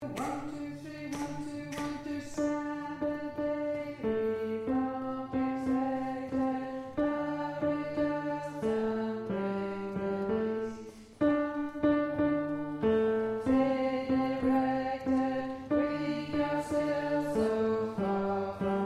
Bass, singers

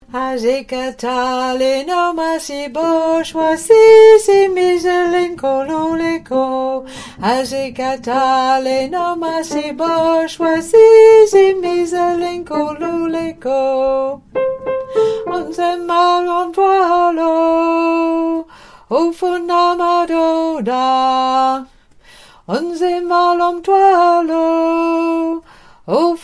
Alto; Asikatali

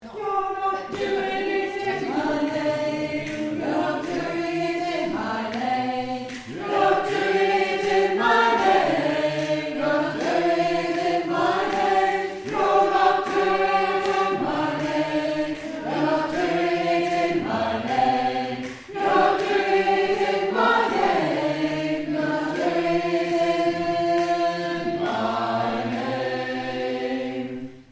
Chorus Alto

